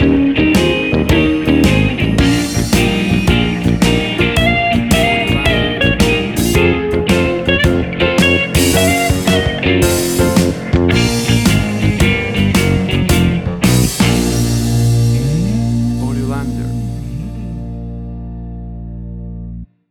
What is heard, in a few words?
Blues Bounce